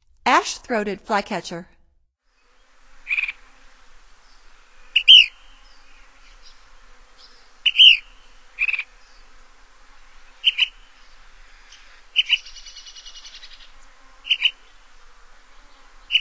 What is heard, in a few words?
Ash-throated Flycatcher; North America; Stokes